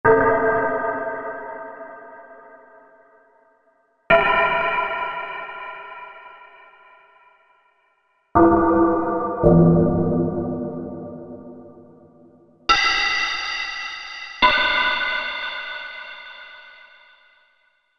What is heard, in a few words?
Anvil, Percussion